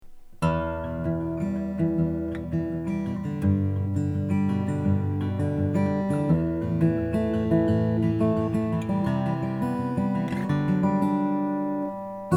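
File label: tippin forte brazilian rosewood, guitars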